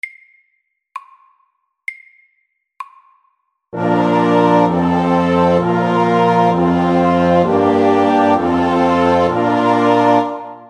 Kromatisk koral FORT

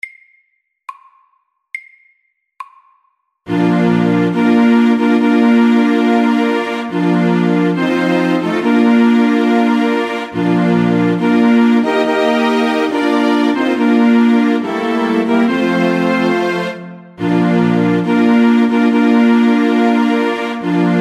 Brudemarsj MEDIUM 70

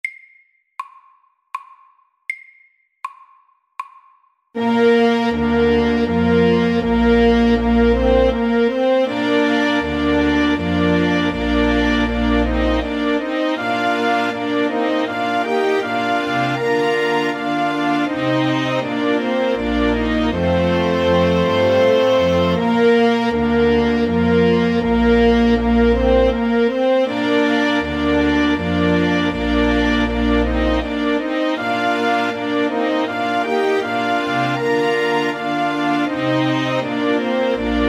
Menuett SLOW 80

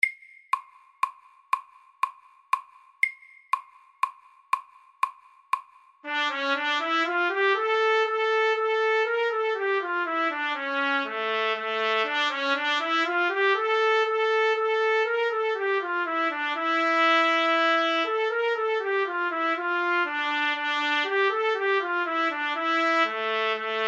Etyde Andante MIDDELS

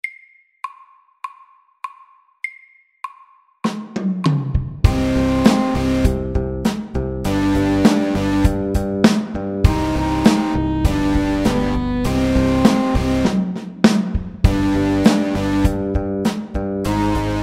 Elektrisk rock MEDIUM 100

